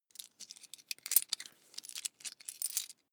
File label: coins clatter soft